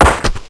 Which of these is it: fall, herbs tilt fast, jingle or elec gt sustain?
fall